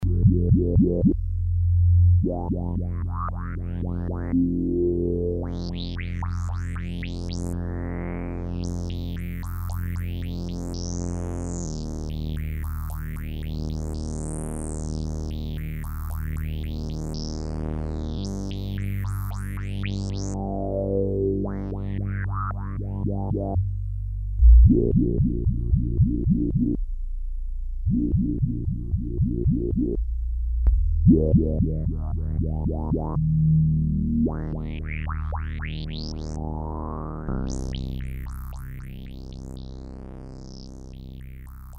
bass and strings

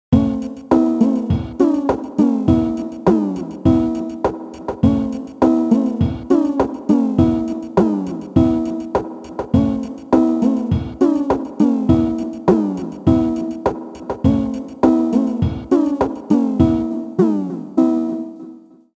Ambient

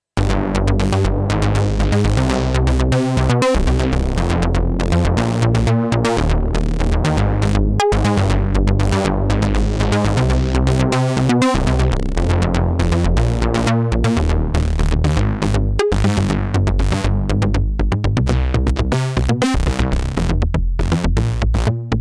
Entropy Bass

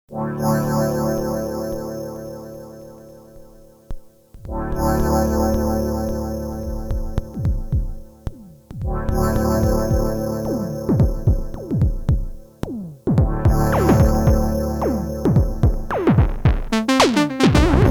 jazz

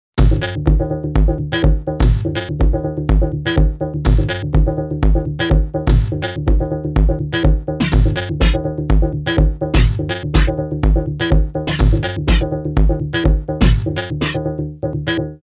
Bass

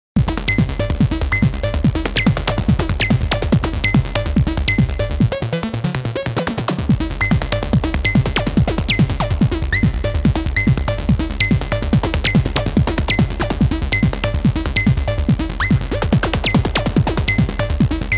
Analogue Loop

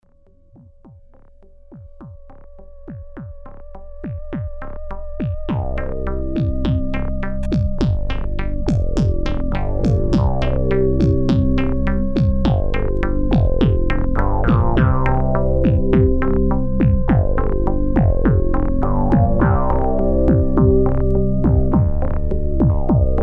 electro

